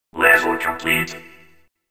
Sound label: level complete